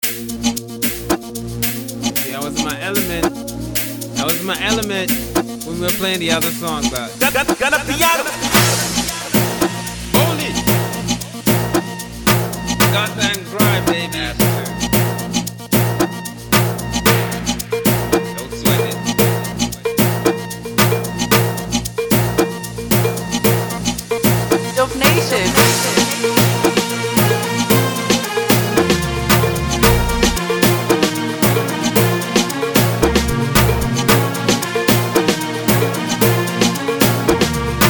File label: Gym Song Beat